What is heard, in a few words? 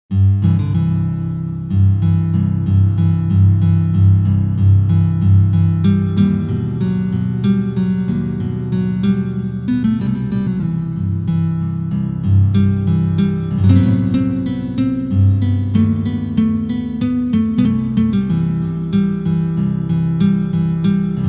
Compas Interior, Solea Nana, flamenco